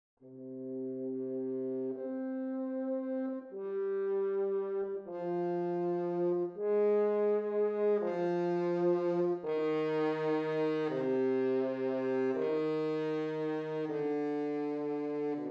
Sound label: Horn